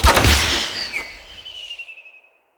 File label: Hunter Bow Ranged Shot Arrow Rain; Weapons; Player; Envoy; Bows